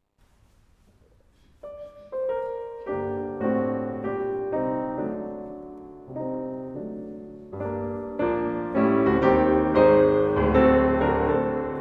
Sonata for Cello and Piano in G Minor Op65 I Allegro moderato LIVE; Chopin